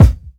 smooth bottom end kick drum b key; kick drum samples

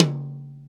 verby tom c sharp key

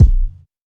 old school phat kick drum single shot b key

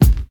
studio kick drum sound a sharp key

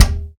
sharp tome drum sample d sharp key

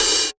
sparkly open hi hat sound c key

